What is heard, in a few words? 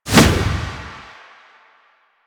Finisher Whoosh B; Finishers; Enemies; Warframe; Kuva Lich